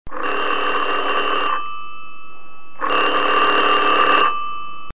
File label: 1920s Phone Ringing; Electronics